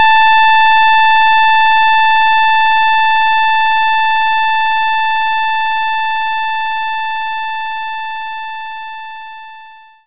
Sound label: Sawtooth Wave A3